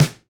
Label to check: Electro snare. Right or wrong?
Right